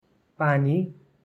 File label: water; grade3; preventing diseases